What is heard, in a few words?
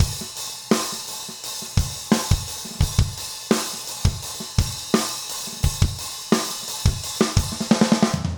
rock 85